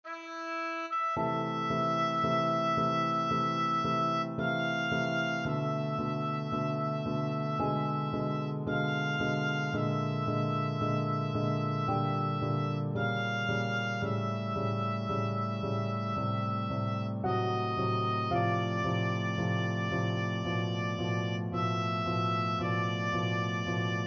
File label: chopin, oboe